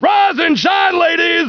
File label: wakeup